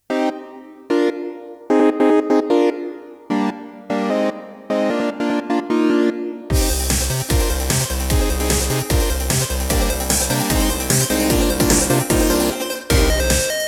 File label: Theme; Release Trailer 999